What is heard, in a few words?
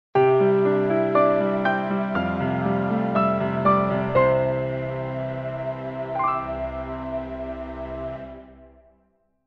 piano; Logos